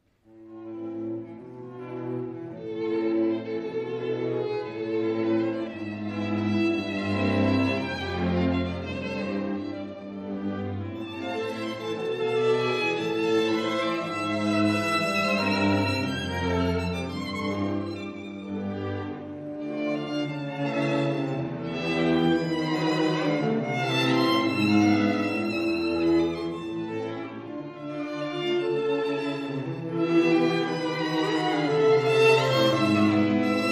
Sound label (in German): Streichquartett a moll; Beethoven; Op.132